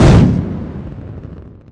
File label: Electro FX; UNUSUAL KITS and FX; Ueb HE Electro FX